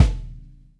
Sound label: Kick Drum